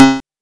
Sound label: Apple IIgs Boot